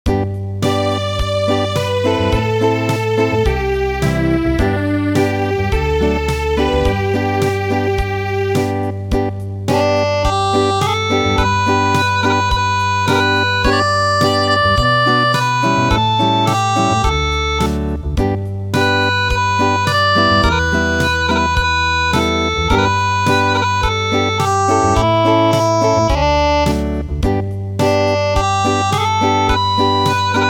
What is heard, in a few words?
Acoustic, traditional; Tea picking